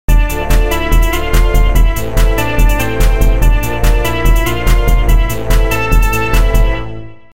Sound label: drum test